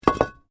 Brick Medium Hollow Fall

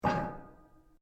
METAL ON METAL; Metal (Sound Effects:Materials)

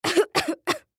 Human Cough; Cough Element Girl; Human Cough Element Female Young Girl; Sick; Blastwave

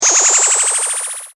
Generic Bonus Or Pickup, video game; Video Games; Stuart Duffield

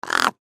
Bird Raven Angry Squawk; Animal; Birds; Blastwave

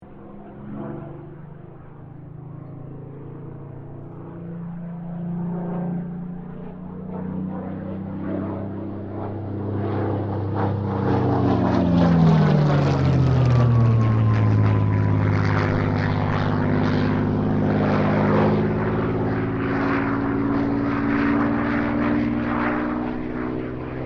AIRCRAFT SUPERMARINE SPITFIRE MK-XVI LOOP; Airplane Sound Effects; Vehicles

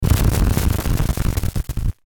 Static Stutter Glitch, Static Off Air Buzz; Production Element Imaging Element Accent Transition; Blastwave; Static Sound Effects

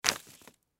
Rope Drop Toss; Rodeo Sound Effects; Backgrounds; Foley; Blastwave

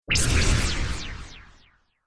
Laser Swish Delay, whoosh sound effect

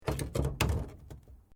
Metal Light Movement Minor; Materials

